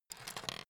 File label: pen pickup